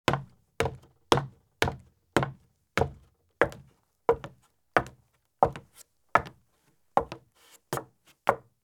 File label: Women's Thin High Heels Walk up Steps and Across Wood Porch; Sound Ideas; Footstep Sound Effects